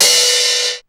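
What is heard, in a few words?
RX15 open hi-hat; Crypton - DrumBase5000; RX15+17+21L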